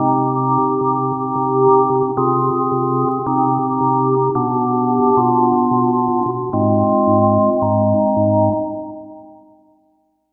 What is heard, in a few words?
Organ; Peace Organ; Sonic Foundry (Sony Creative Software); Dr. Fink's Funk Factory; Keys